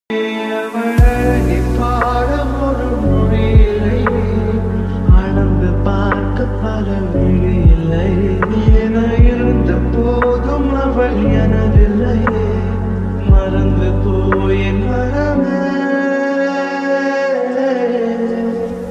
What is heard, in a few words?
lofi song love